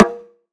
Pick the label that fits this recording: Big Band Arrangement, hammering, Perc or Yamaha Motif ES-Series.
Perc